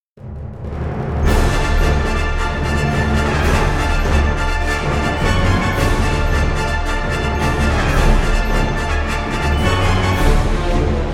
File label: Space Adventures Orchestra Music Star Wars Style